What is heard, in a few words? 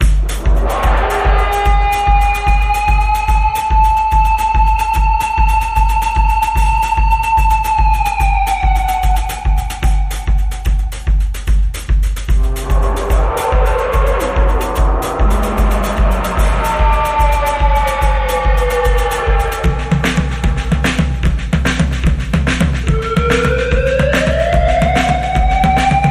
Scary Action